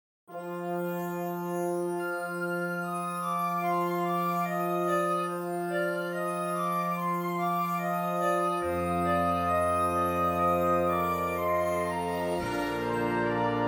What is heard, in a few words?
Firebird Suite